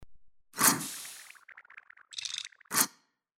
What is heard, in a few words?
raygun reload